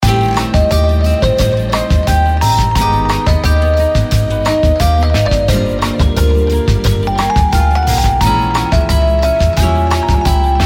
bgm MainScene; BingoGrassland

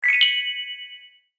dissolve; BingoGrassland

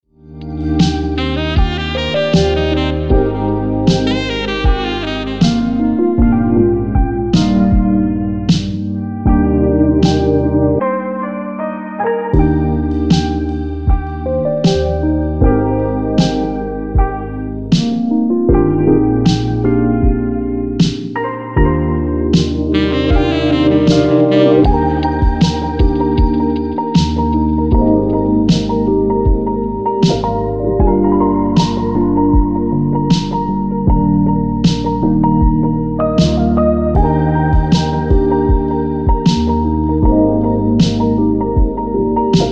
game bgm; BallCrushBest; LoveLegendAssets

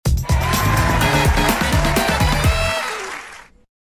pickbox enter; BingoGrassland

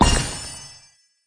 dissolve; RedHotRoast

